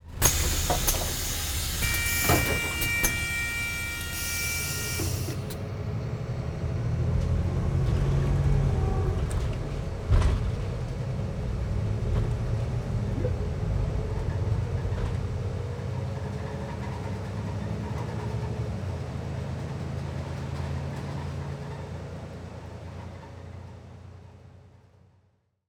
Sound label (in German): BUS